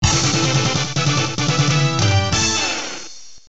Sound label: Player Win; Schmeiser Robo; arcade